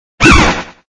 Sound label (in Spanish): Grito; Flapple